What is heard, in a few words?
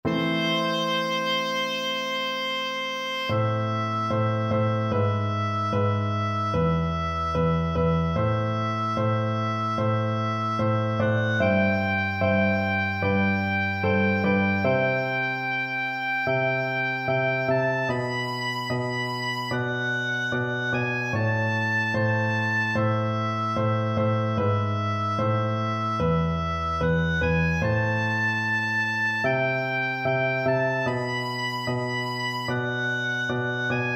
beethoven 7 2nd, oboe